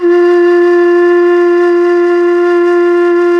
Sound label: NYE FLUTE; Nye Flutes